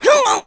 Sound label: Struggle; Link struggling (trapped in a barrel, etc.); Zelda